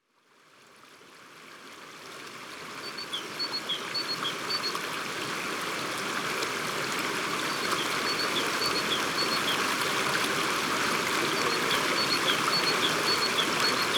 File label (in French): Le ruisseau et la mesange Gourdon Ambiances Sonores d Ardeche; Studio Les Trois Becs